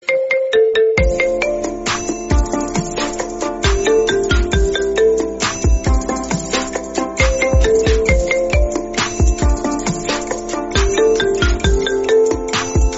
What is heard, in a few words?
best calling ringtone